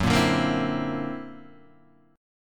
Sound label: D Augmented 9th F sharp, guitar